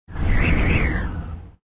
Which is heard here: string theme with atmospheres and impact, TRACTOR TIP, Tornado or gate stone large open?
Tornado